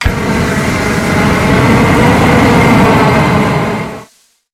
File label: flamethrower shot; Mono; Weapons; Real Guns Sound Pack 2